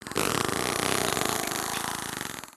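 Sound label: Pets Insect Large Angry; creatures; Lego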